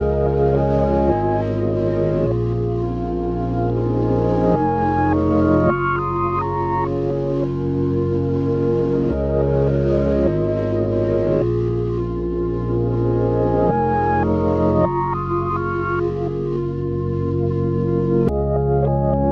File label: 105 BPM C# Maj, Melody; Cymatics; 2022 Melody Collection Platinum Expansion